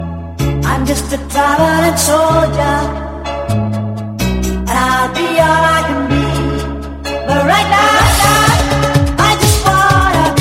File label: english mix ringtone; english ringtones